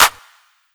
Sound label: Clap